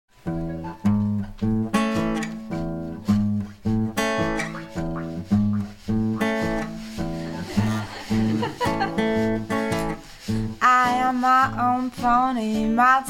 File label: Spooky Pony Blues